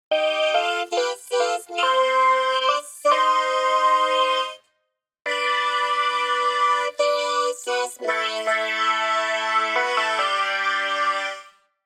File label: Vocorder